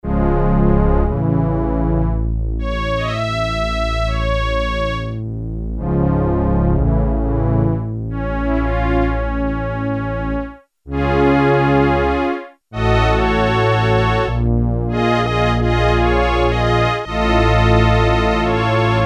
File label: Low Strings 16', Korg Poly-61M